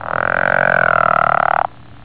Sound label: palustris, amphibians anura